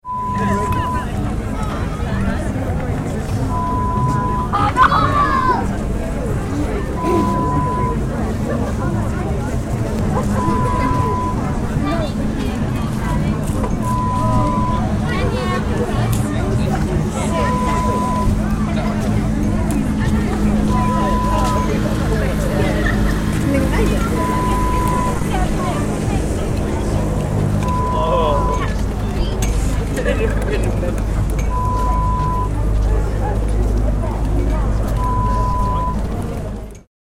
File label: County Show sound